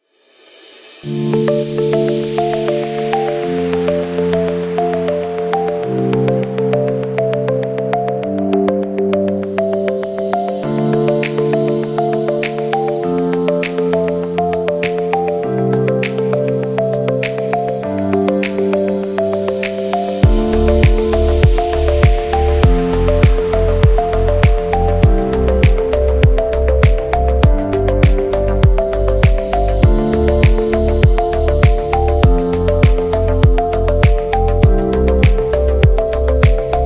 Technology Advertising

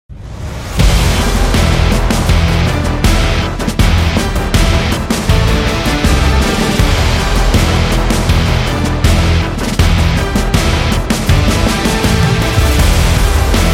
Epic Energetic Rock

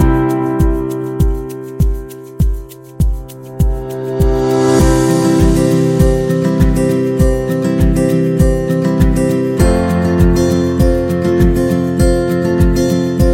Happy Acoustic

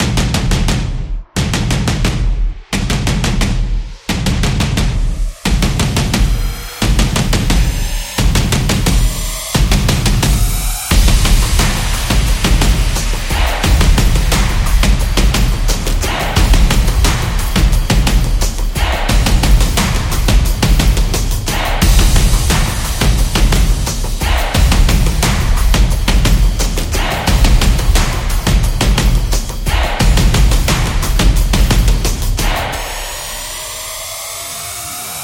The Drum Trailer; tribe

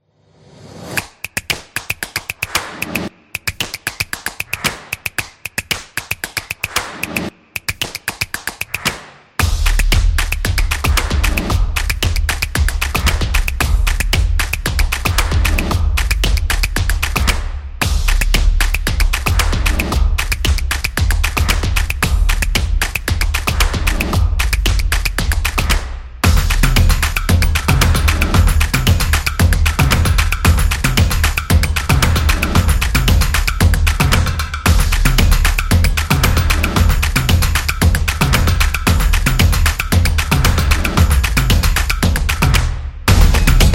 A Drum Beat; graffiti

